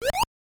Jump Attack; nes; Super Mario Bros. 3

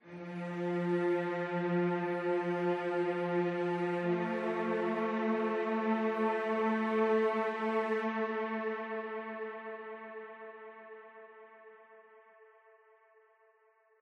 Diving Music Cello

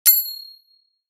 Bell

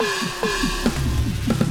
Combat START, soundtracks

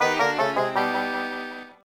Jingle ~ Dungeon K.O.; pokemon mystery dungeon explorers of time darkness 2007 nds

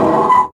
Metal Scratch

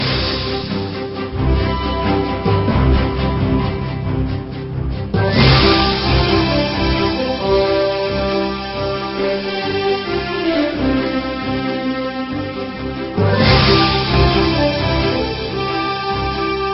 Pirates Background

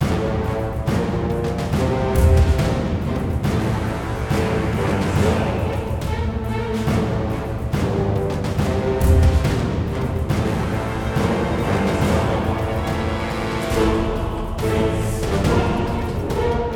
Dungeon Boss Battle; DG Catacomb - Rubear Napgoldao

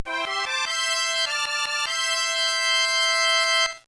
jingle; Petz Hamsterz Life 2; game boy advance

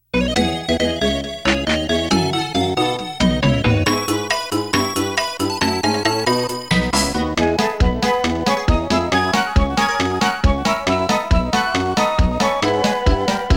Toy March; TwinBee Rainbow Bell Adventure; Area 4- Toy A

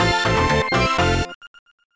Professional Jingle; superlite 2500 custom mahjong

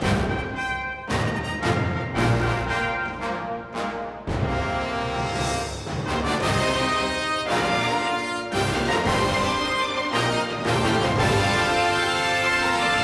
Cinematic Music - Heroic Fanfare; ps3 gamerip 2012; LittleBigPlanet Karting

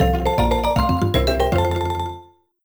Received a Pokémon Egg!; pokemon heartgold and soulsilver

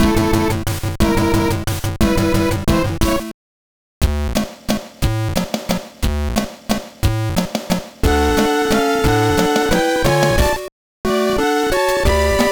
BGM

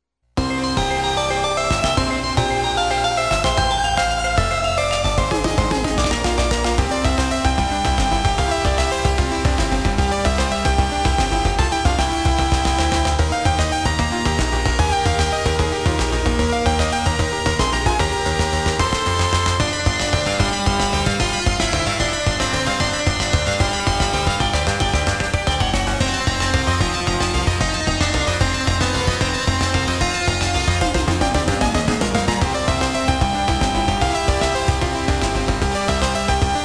Fly High

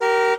SFX Car Horn; life is a game android

